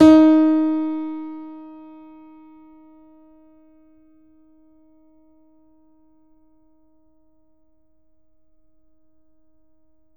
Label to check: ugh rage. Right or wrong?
Wrong